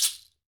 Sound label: TANZANIAN, African Perc; E-MU Formula 4000 Series Vol. 4; Earth Tones